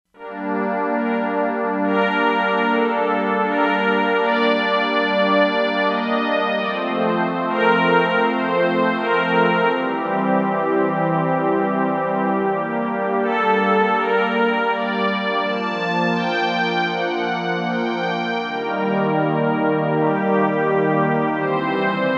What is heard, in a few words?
Strings, Korg MSC-07S Synth; Synth 2; 10percentStrings